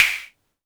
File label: polyvox snare; Formanta Radio Plant